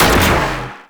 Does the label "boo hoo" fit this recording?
No